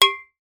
impact metal small pipe hit another; zapsplat

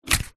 foley cardboard thin strip pick up from table

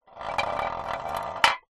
drink can empty drag concrete ground

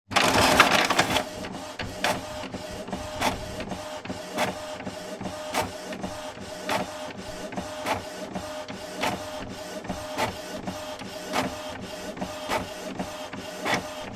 office printer printing epson stylus sx235w; sound effects one

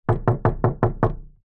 household wood door loose knocks x6; zapsplat

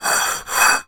tin empty metal small slide on table; foley; zapsplat

